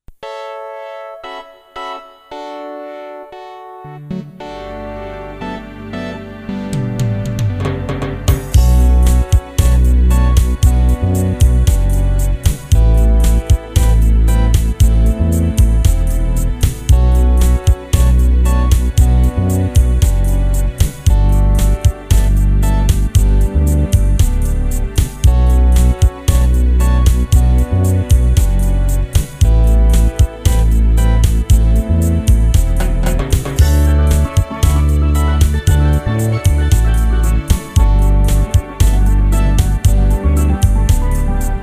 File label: Chill